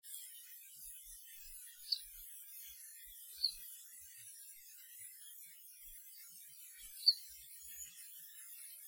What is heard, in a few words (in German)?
Steinschmätzer